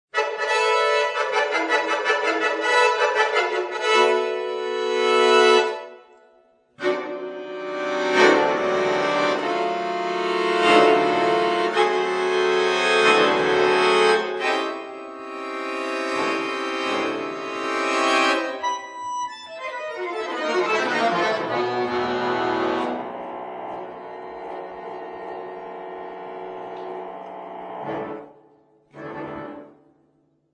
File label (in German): Sonate fur Akkordeon, Allegro; A.Gaidenko